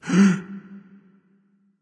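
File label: nonverbal breath; smite; voicepack; ullr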